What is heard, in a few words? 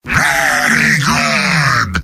heavy positive vocalization; mvm